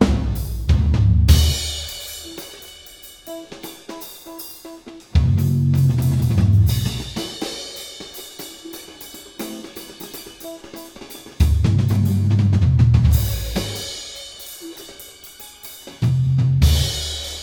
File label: jazz with a trumpet and